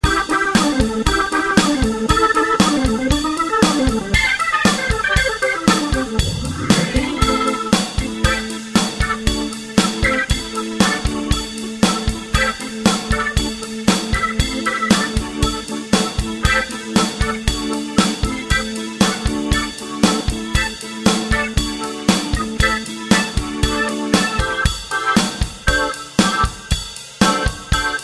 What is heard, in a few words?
Major Latin Organ